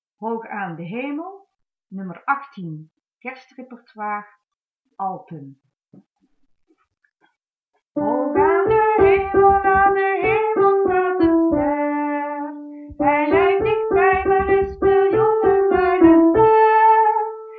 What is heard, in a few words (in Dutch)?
Hoog aan de hemel alten